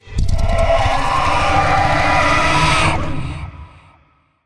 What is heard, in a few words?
Vocal Idle; Warframe; Zariman; Void Angel